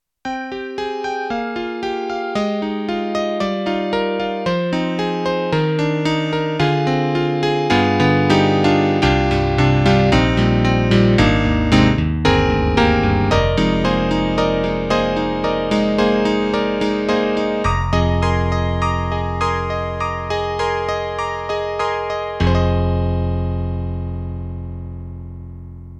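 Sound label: SD-80 Electric Grand Piano; EDIROL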